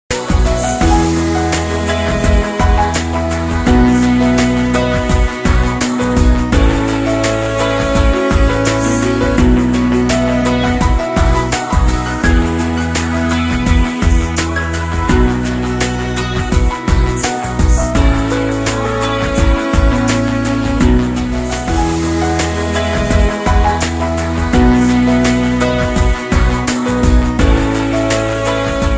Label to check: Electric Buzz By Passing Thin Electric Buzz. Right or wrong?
Wrong